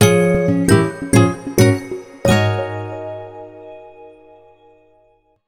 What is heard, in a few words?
Intro Jingle; wii party u soundtrack; Flock Shot